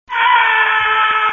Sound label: ahhhhh